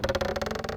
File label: Rotating Log